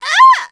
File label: Enemy Generic Human Death Crushed